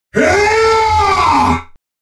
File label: Space Marine yell